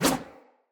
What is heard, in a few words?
Sword Whoosh; Weapons; Player; Swords; Garren Sword